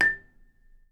A5, Percussion & Harp; Miroslav Vitous - Percussion & Harp

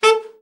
BRA ALT SRT, SAX; Best Service Gigapack 1

